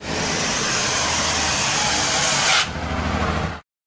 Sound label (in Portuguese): Freio Motor